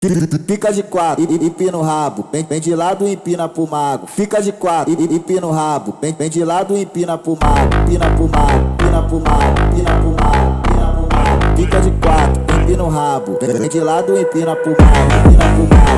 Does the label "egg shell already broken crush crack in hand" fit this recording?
No